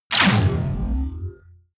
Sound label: sci-fi shock explosion